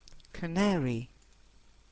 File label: canary